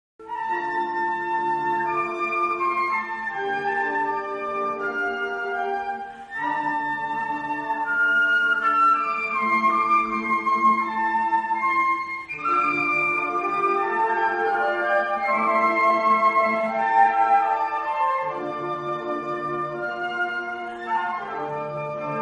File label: concert, flute; autumn concert 2019